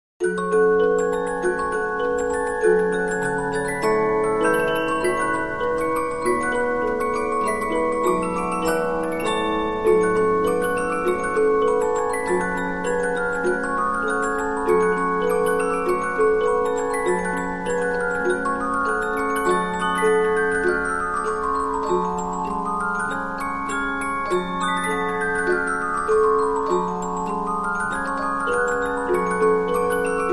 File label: Polyphon, 15inch disc musicbox